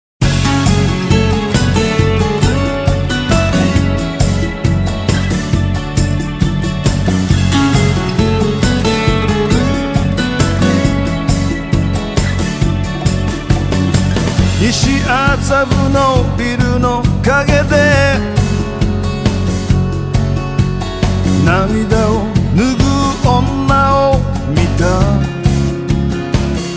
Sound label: guitar mix